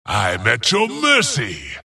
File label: death long; dota; skeleton king